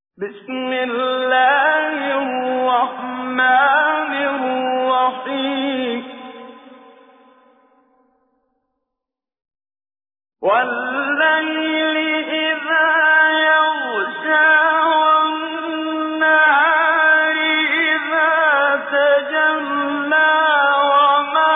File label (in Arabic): سورة الليل, تجويد; المقريء الشيخ محمد صديق المنشاوي